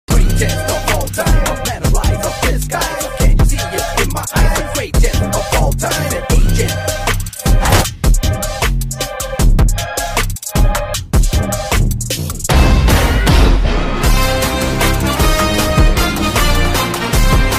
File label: trailer bgm